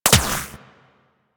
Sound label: Shield Impact